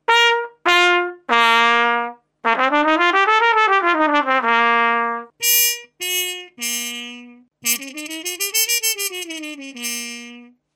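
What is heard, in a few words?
trumpet mute copper wah; faxx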